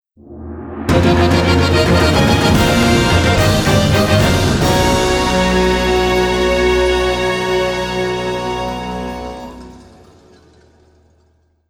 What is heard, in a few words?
Battle Start Fanfare; caravan stories vol.1